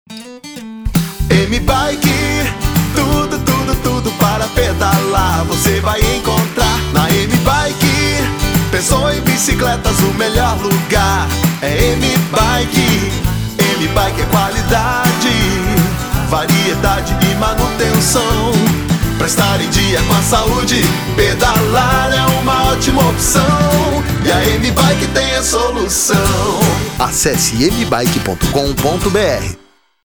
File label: Jingle; Head Produções